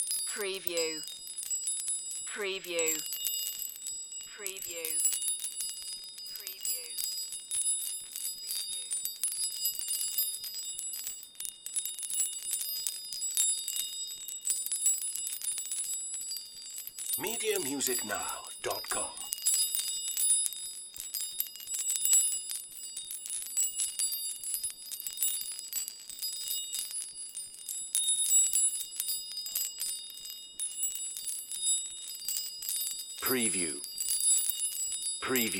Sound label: Windchimes Long Loop